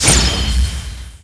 sting eruption

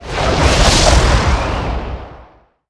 Atk Skil

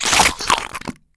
lips die

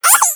Slime Sad

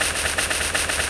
Spider Run

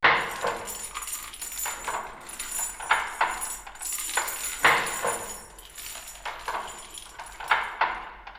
Water Mall

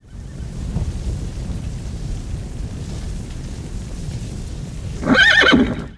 Keenkirin idle; Seer; GamigoNA

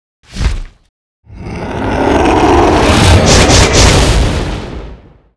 Dragon Skill; Seer; GamigoNA

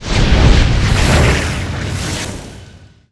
Fire Bolt

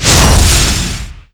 Charge Damage; Khazul; Gamigo

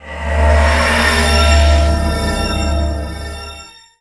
Leech Magic

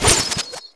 Fury Impact; Seer; GamigoNA

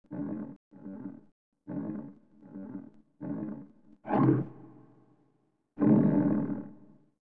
Ratman Idle

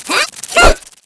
Attack; Greenky; Gamigo

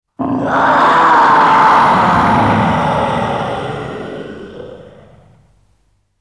Scream of the tree

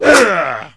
Spade Guard Trumpy Atk

